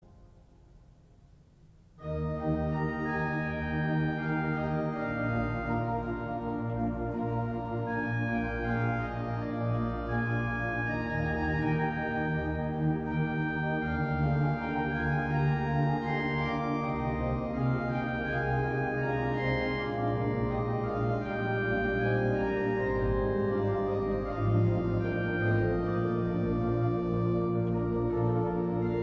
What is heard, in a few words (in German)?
Vivaldi, Winter (2. Satz) arrangiert für Orgel